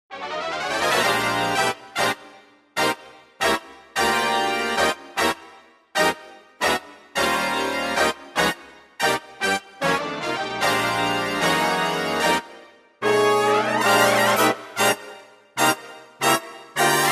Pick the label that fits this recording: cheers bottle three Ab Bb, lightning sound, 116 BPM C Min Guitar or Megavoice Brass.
Megavoice Brass